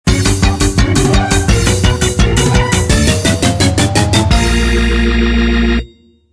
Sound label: Jingle, Yoshi team jingle